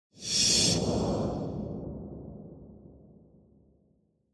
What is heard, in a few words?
Scene Flashback Short